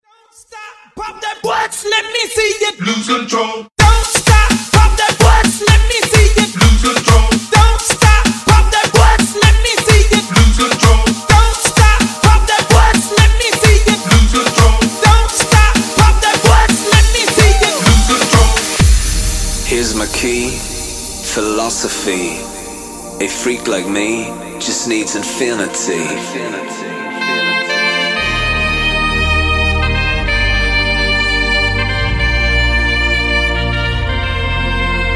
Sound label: Trompeta Acapella 128Bpm